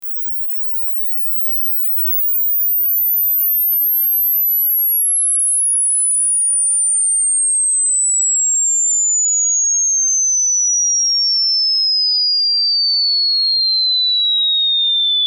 Test Tone Oscillator Log Sweep Sine Wave Descend 20Khz-20Hz -12Db